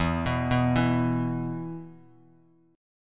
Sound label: E 5th, Bass Standard; arpeggiated; JGuitar